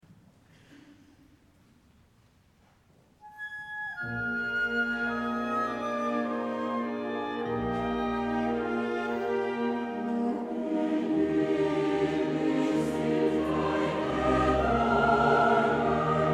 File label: choir singing; brahms; wie lieblich